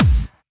Kick, Drum Hits; Zero-G Trance Inducer; 90 s Sample CDs

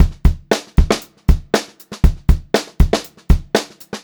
Pop-Rock Drumloops 118 POP VARI; USB Soundscan vol.37; AKAI

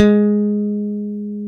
G#3 pick, pick bass; AKAI S-Series CD-ROM Sound Library VOL-7

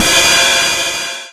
Boss DR-202 hat

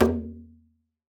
Talking Drums, f2; Retro Sampler; EMU E-III

